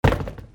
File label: FALLING